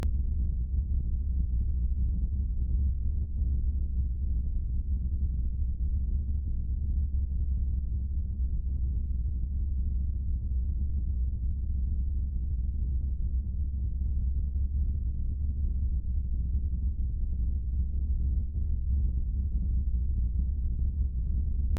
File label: hum; Incantation